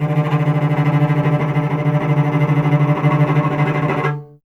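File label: strings cello D; Miroslav Vitous; Solo Instruments VOL-1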